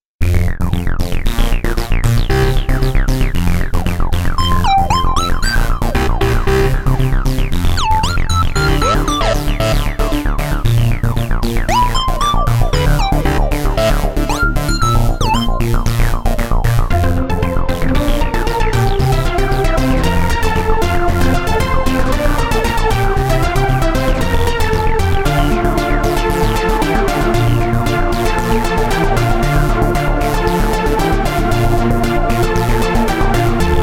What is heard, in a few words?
trance; Exolon